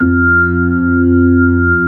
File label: HAMMOND F3, organ; AKAI S-Series CD-ROM Sound Library VOL-2